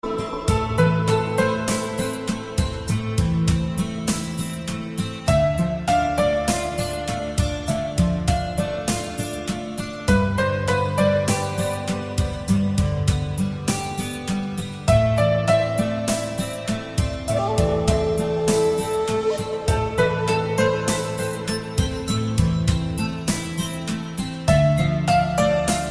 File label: Leaving Autumn Alarm